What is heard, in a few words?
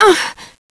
pain; cstrike